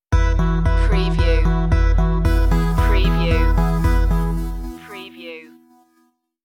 modular motion stinger

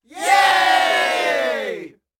Crowd Yay

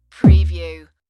Bass Doing

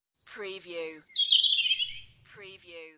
UK Birds; beanstalk audio

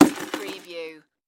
Bucket Drop Debris; sound-effects-bible

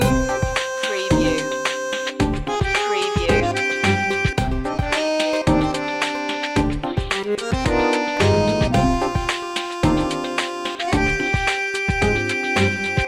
tango chill loop

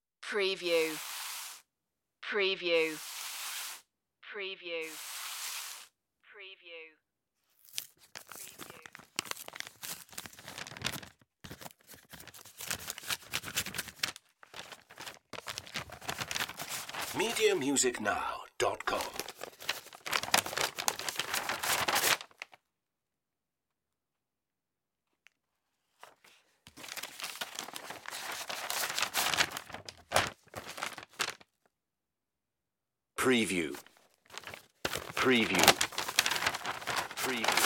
envelope handling

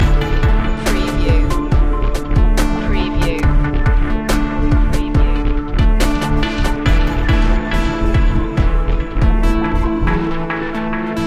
under the surface loop

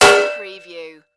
Impact Metal; Action, Impacts & Explosions

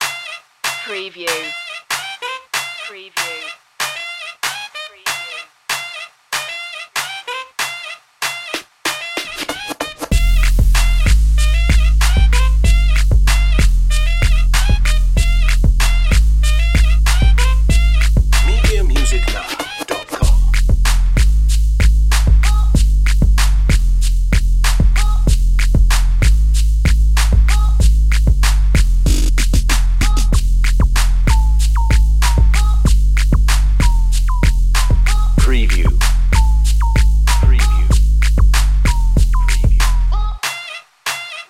beat the sax

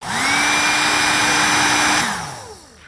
Heat Gun Strong Short

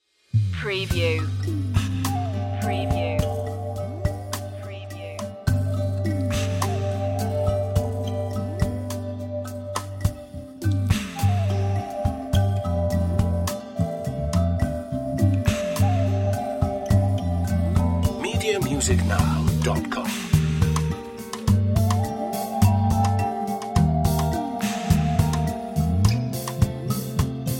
safari lite no bells

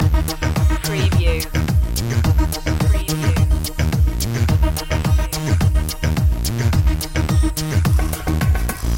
caribbean party loop

